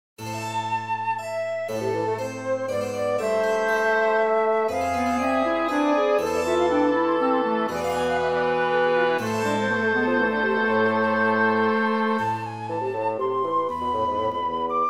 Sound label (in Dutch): Houtblaas Quintet; Woodwind Quintet. Scored for flute, oboe, clarinet, bassoon and harpsichord.; 1st Movement